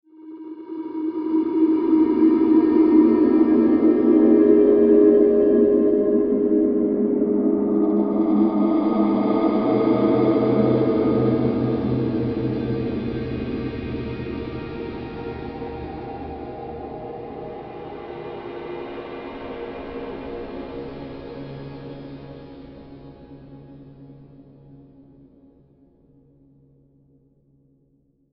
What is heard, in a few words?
Bed Feedback Delay Reverb, Bed Short Musical Static Distortion; Bed Sound Effects